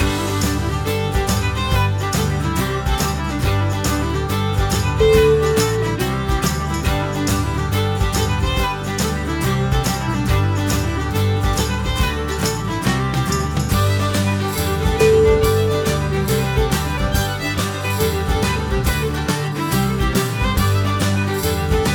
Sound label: celtic bounce